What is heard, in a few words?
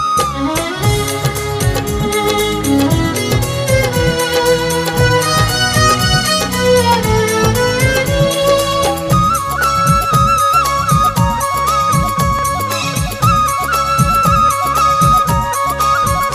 Music Ringtone